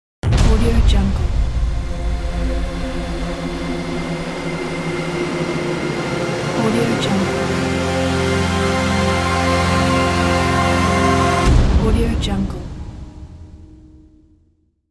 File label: futuristic intro; music2noise